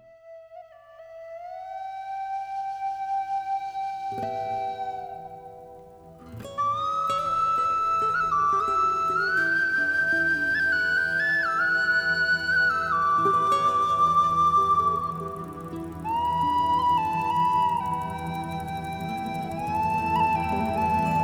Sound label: Irish Wistle Blow